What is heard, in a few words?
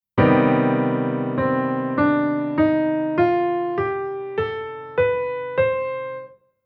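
Chord Scale Minor Melodic Minor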